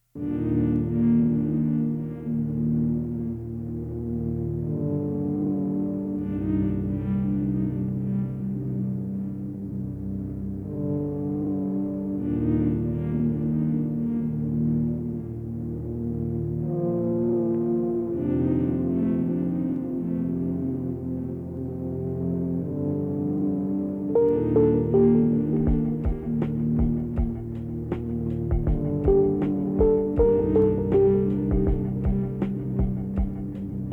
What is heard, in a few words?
OPERATOR 1 BEAT